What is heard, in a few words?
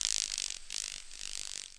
GRASS, nature; PC Gamer IT CD 40; zones